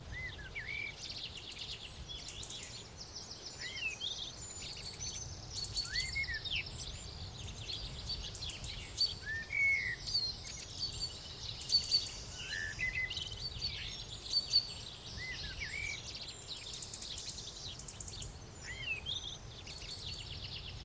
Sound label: FARM BACK